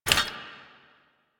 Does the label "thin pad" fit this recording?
No